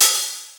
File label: Open Hihat